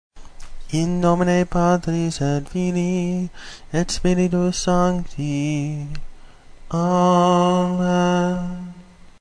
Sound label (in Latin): In nomine Patris Tonus simplex